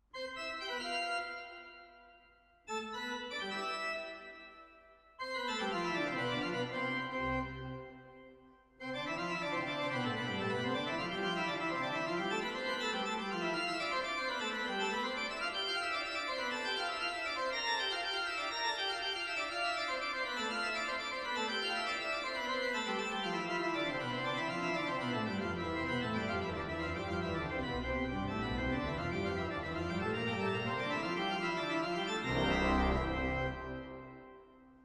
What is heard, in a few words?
mictest organ, microphone comparison cardioid; neumann